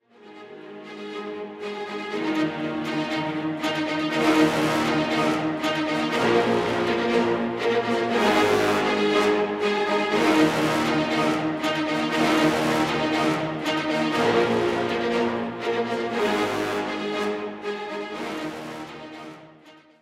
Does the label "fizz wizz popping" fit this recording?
No